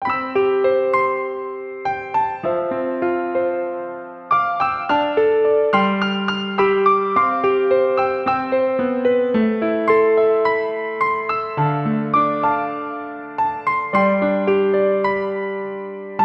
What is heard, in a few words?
Piano Ver.; Parfait Chocolate Second Brew Re-Order Arrange Soundtrack